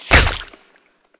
impact; Original TK Sounds

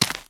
dirt step; Golden Crayon Sounds

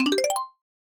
Folder Opened; quinton's new sound scheme

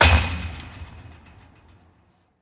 wall fence; Golden Crayon Sounds

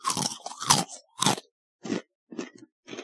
eat chips; Golden Crayon Sounds

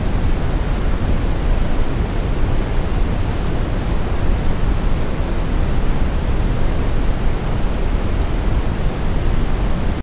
Brown Noise; iOS Sounds

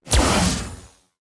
store spawn dist; Original TK Sounds

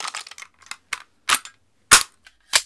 shotgun reload; Original TK Sounds

